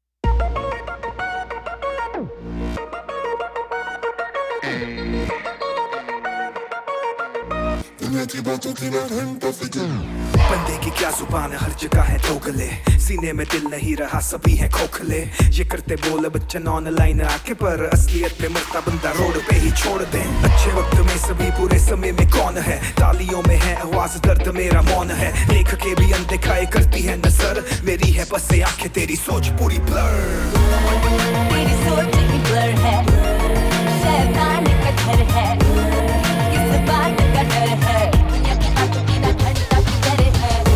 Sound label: Title Track - Male Version